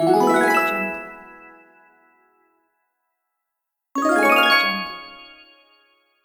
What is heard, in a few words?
cartoon transitions